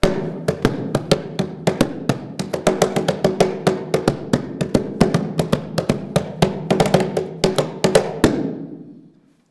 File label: cajon